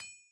anvil; propack

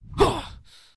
daemon attack; propack

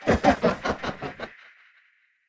tower attack; propack

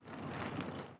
catapult walk; propack

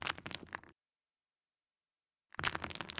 golem walk; propack

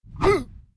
swordman attack; propack

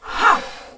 summoner attack; propack

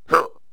stickfighter attack; propack

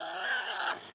pig die; propack

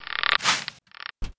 attack; propack

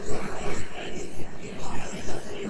summoner summon; propack

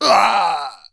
guard die; propack

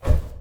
axe thrower attack; propack

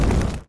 archmage hit; propack

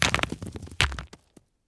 golem die; propack

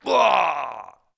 archer die; propack

